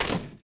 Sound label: fs metal, droids robots